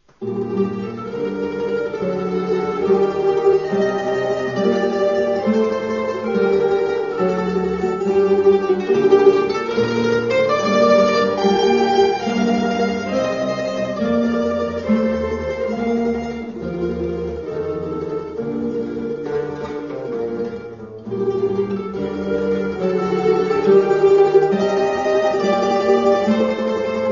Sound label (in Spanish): Musica Acuatica Minuetto